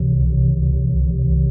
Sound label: Charge Sci-Fi High Pass Sweep Semi Low Loop; The Looker